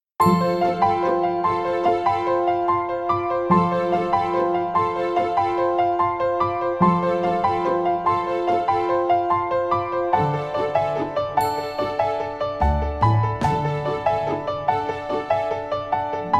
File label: Piano Orch; Stanford